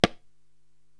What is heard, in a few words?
Tabla Mute, Korg TR-Rack